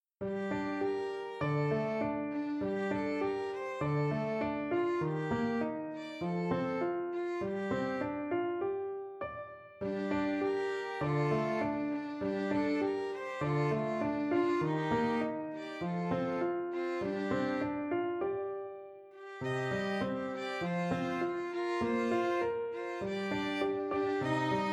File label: Violin and Piano